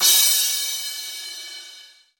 Big Room Crash C Key, Royality free crash sound tuned to the C note. Loudest frequency: 7815Hz